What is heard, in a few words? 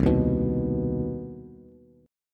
C Minor Major 7th C Bass; strummed; JGuitar